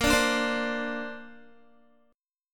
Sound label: A sharp Major Add 9th, Guitar; A#add9; strummed